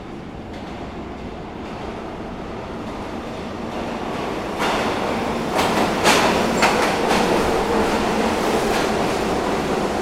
stacja metra

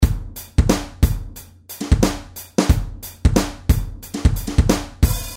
perkusja

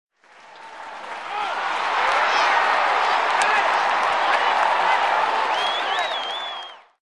na stadionie

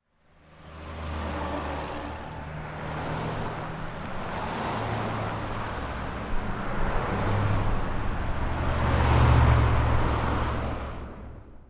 ulica autostrada

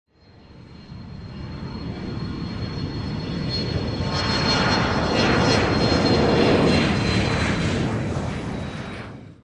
na lotnisku

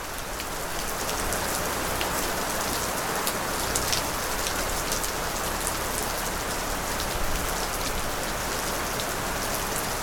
deszcz

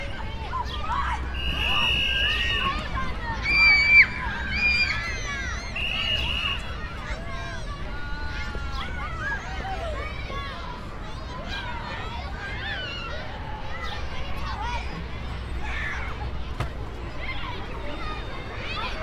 boisko

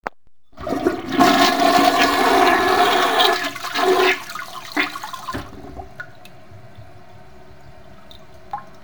toaleta

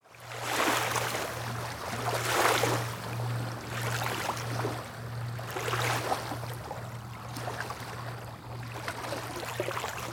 jezioro

